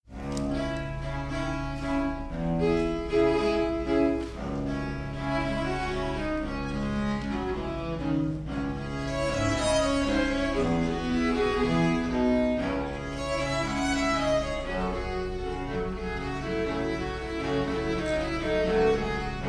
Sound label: Tango